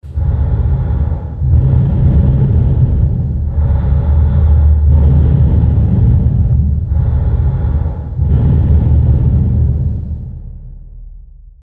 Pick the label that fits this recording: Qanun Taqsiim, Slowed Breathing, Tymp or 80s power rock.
Slowed Breathing